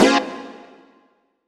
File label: Stab C#3, Club Techno; Zero-G; Stabs